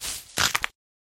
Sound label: crack neck